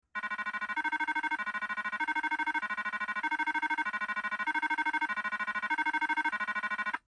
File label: UNITROL ULTRAHILO (SUBTONE); 911 Signal